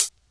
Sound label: HIHAT HIP; Best Service Dance Mega Drums